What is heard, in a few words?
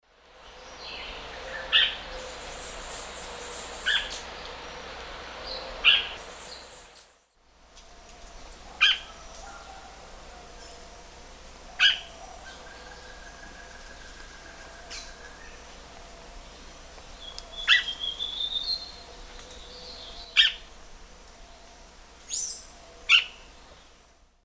Cercomacra cinerascens, call, Eastern Lowlands, S. America